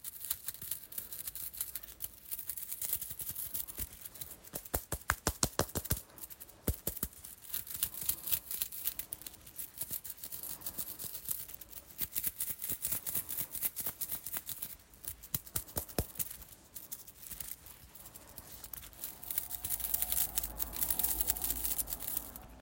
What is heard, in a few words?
fidget bike chain; Golden Crayon Sounds